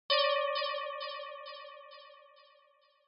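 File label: Onomatopoeia Negative Delay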